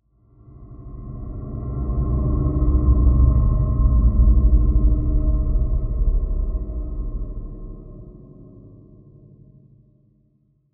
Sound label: Sci Fi Magnetic Whoosh Slow Pass By Energy Flutter